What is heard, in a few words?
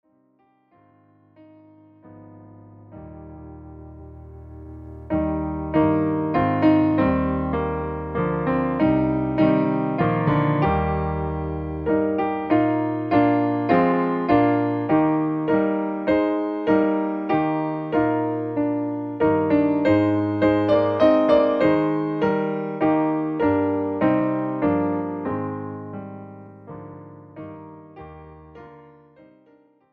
PIANO; gospel